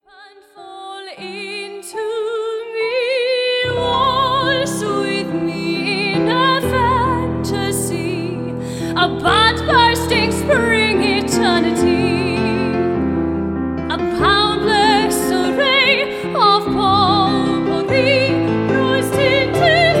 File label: Waltz